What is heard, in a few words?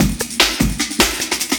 Classic Beat, Drum N Bass